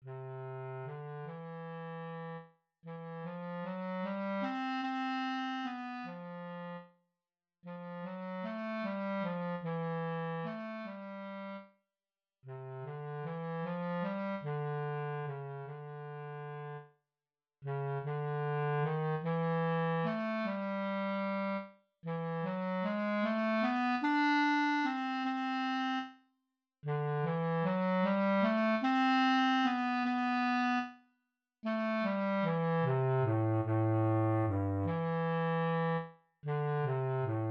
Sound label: bass clarinet etude, 50